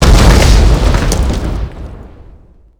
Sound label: grenade explosion combo stone; WAR